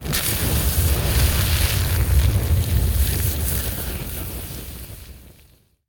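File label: Flame Sizzle Sweetener; Evolve; Goliath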